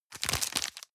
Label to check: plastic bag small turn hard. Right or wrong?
Right